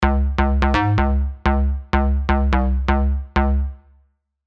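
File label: Sine, bass; tropical pops; passionfruit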